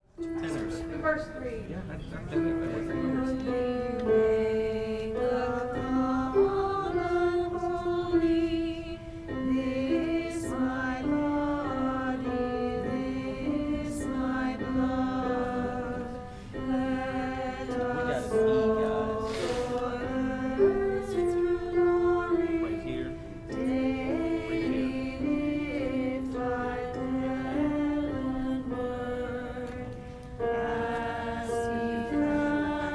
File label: alto; Gather Hymnal